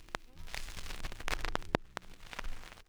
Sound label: Record Noise, Vinyl Record